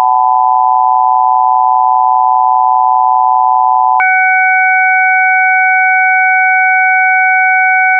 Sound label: buzz roll off test chords